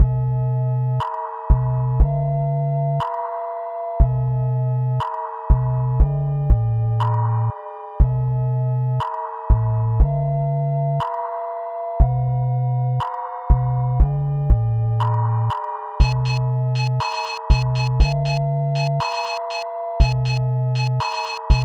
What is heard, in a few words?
sinewave song